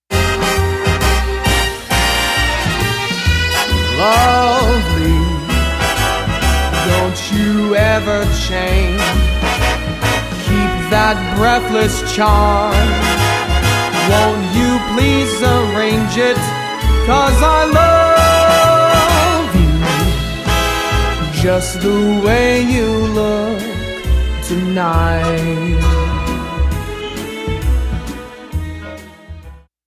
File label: Jazz swooner; Internet Session, Los Angeles